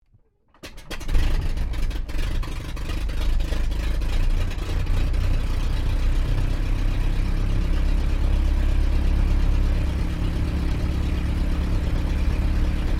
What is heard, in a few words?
AIRCRAFT DH82A DEHAVILAND TIGERMOTH STARTUP IDLE INTERIOR; RAAF Tigermoth; Airplanes (Sound Effects:Vehicles)